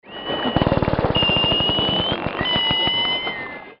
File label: pseudorca, sounds of the sea